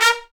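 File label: BRS TPTST, brass trumpet stabs; Roland L-CD702; Stabs-Swells; Tps Stabs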